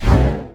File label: lizard, Talk